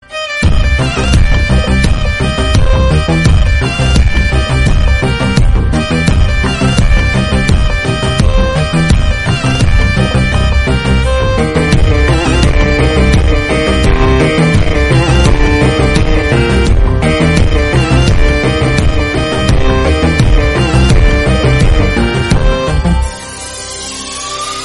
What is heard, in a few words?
Movie Bgm Ringtone; Vikram 2023 Movie